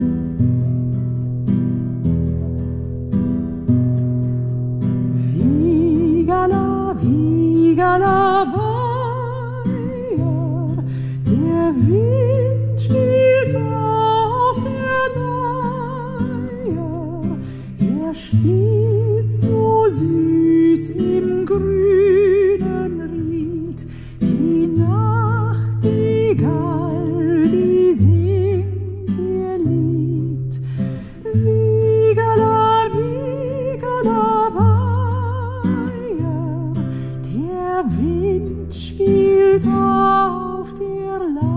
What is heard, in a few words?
guitar; Wigela